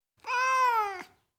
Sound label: Tom Cat, Individual Meows; Cats